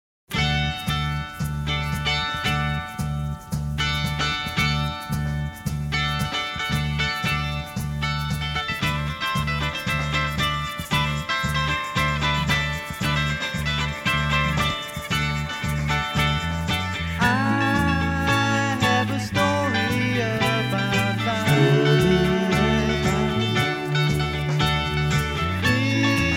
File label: Mandolin Tune